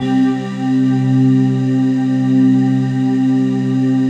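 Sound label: PAD, atmospheric pads; Best Service; XX Large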